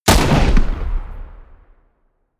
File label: shot